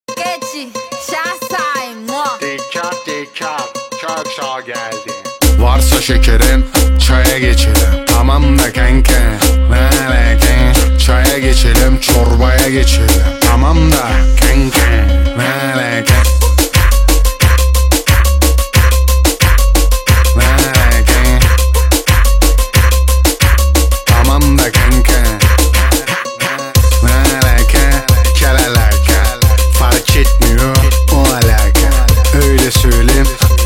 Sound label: Turkish Trap